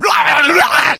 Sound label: become enraged, infected